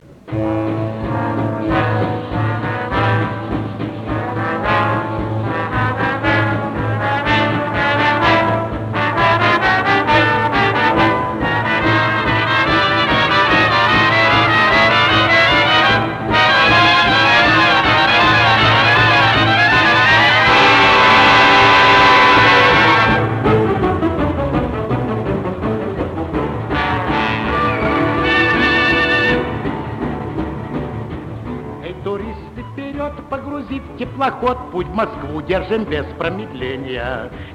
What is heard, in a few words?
pop